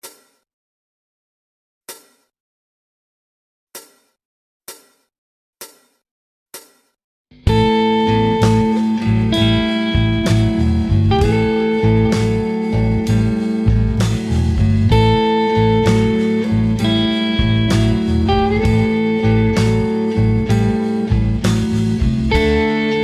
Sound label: Slide Blues slow